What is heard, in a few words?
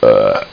belch